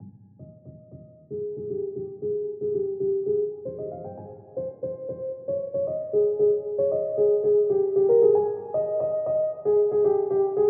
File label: Piano Solo WET 115 F min